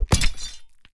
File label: sword attack